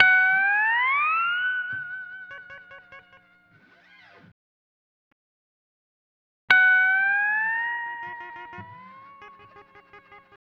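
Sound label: Steel Guitar, F# Dry; Zero-G Kocktail Kollection; Construction Kitz (Lounge); Volcano at Sunrise 074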